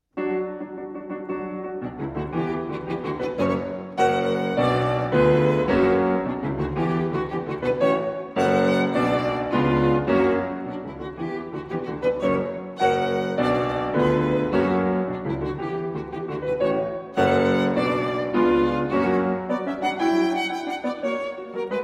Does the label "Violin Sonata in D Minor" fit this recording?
Yes